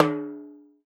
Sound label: TIMBAL, Salsa Kit; E-MU Producer Series Vol. 7 – Old World Instruments